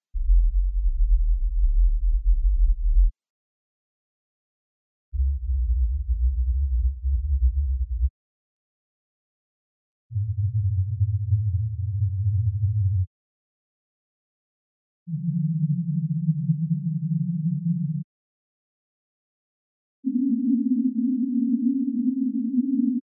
terz rauschen

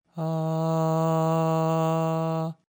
additive synthese

